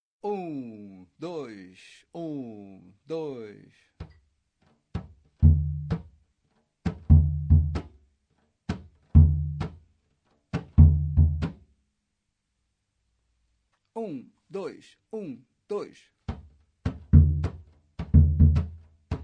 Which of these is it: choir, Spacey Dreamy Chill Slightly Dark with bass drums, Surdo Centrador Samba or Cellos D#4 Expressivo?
Surdo Centrador Samba